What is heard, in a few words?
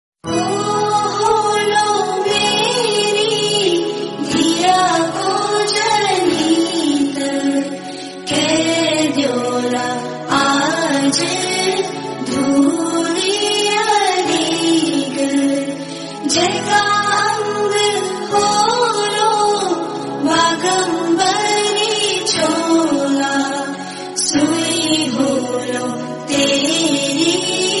Garhwali Mangal Geet Ringtone